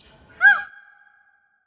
Peacocks Singing; افکت صدا حیوانات; Animals

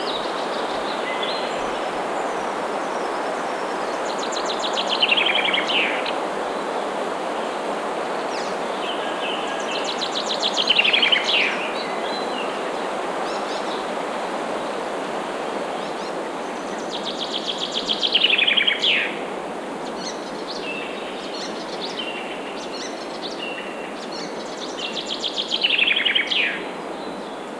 Singing Forest Birds (Loop); افکت صدا حیوانات; Animals

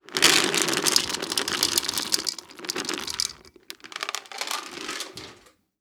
Pouring Dry Cat Food; افکت صدا حیوانات; Animals

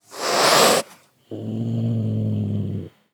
Cat Hisses and Angry; افکت صدا حیوانات; Animals

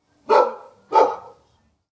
Barking Dog; افکت صدا حیوانات; Animals

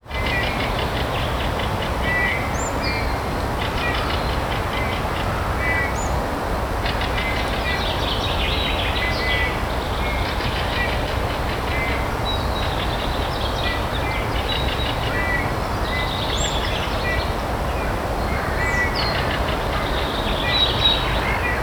Forest Noise and Bird Singing; افکت صدا حیوانات; Animals

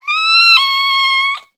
Kitten Meow; افکت صدا حیوانات; Animals

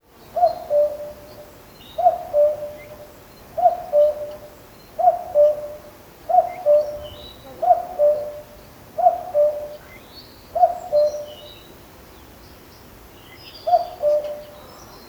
Cuckoo; افکت صدا حیوانات; Animals

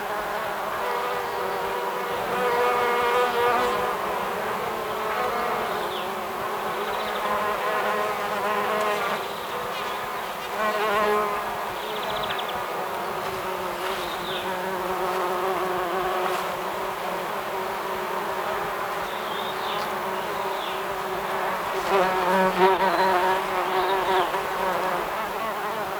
Bees; Loop; افکت صدا حیوانات; Animals

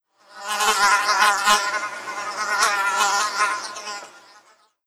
Fly; افکت صدا حیوانات; Animals

